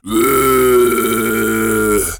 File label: Zombie voice